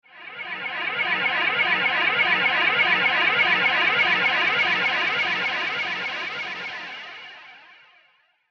Sinister Swell Whoosh; Whoosh Sound Effects

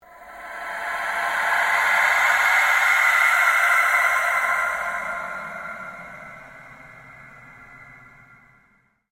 PULSE LONG SWEEP

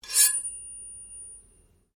Blade Scrape Fast Ring, Sword; Foley sounds for melee weapons; Swords (Sound Effects:Combat)

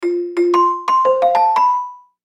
Musical Xylophone, Musical Xylophone Melody; Mallet; Blastwave

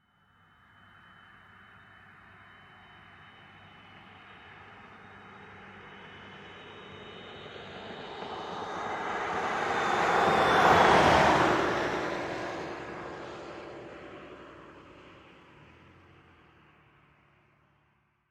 Auto Pass By Automobile Car; Vehicle; Cars; Vehicles

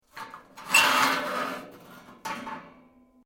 Metal plates scrape; Material components to create more complex sounds; Materials

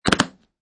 Suitcase Lock

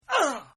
Grunt; Partners In Rhyme

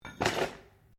METAL ON GRAVEL IMPACT GENTLE; Metal (Sound Effects:Materials)

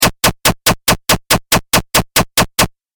8 Bit Style Repeating Machine; Video Game Sound Effects

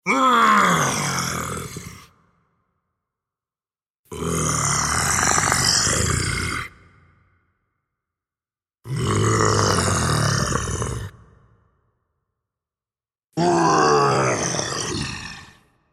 Monster Growls, Monster growling .; Monsters; Horror

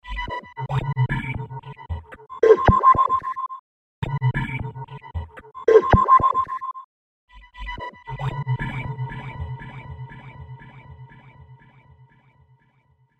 Rise Of The Robotic Army, robot sound effect; science fiction sound effect

